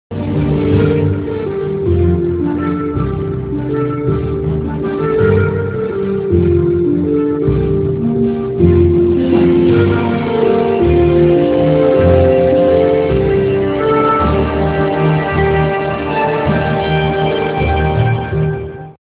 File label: tone; tes shadowkey